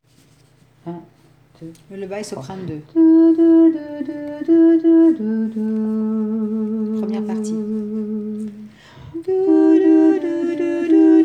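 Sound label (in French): voix melodie plus soprane 2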